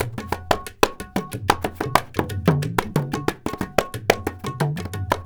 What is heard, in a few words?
India, Heart of Asia